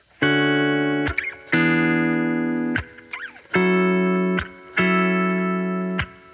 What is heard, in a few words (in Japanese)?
ゴーストノート